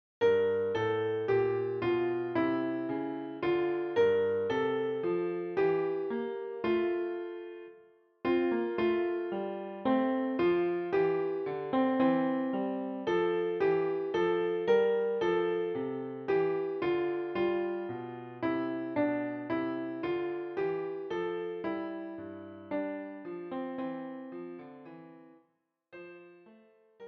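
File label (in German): Herbei o ihr Gläubigen Klavier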